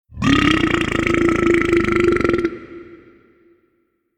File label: Burp Sequence